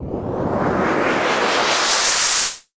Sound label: ladder Climb Sweep; netent